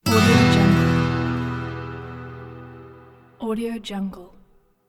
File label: transition acoustic guitar